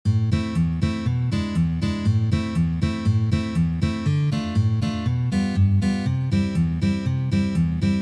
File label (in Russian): Boj